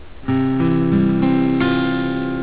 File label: chd csus, guitar